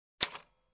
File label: Typewriter Press